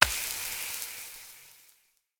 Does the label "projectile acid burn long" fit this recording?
Yes